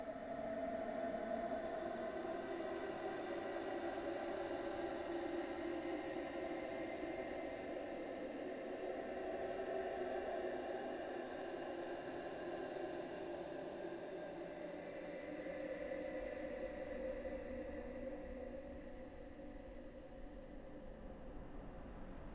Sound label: laughter paulstretch